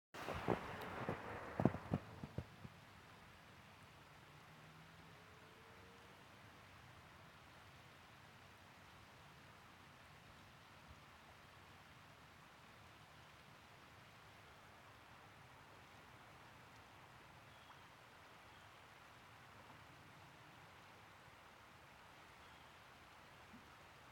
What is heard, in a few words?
falls sledding hill; berea